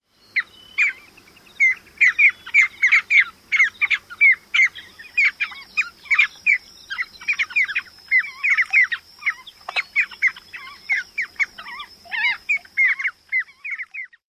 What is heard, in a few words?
calls of migrating group